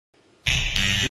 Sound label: incorrect buzzer sound